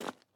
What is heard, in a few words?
ice step; teardown; ps5 windows xbox series xs